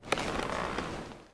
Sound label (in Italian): Albero, natura